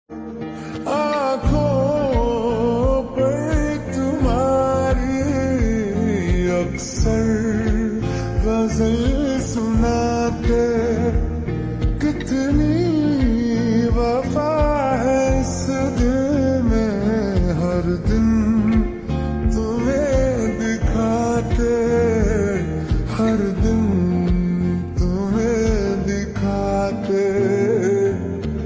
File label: Ghazal Slowed Reverb